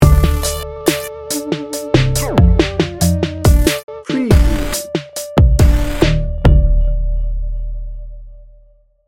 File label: urban culture sting bumper